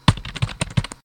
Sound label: Typing; clockwerk opensim